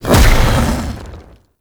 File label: Jump Launch; Warframe; The New War; Archon; Amar; Movement